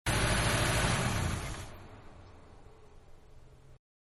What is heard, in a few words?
Mid Size Car Idle Off Exterior; Vehicles; Car Mid-Size-Idle And Off-Exterior; Cars; Manhattan Production Music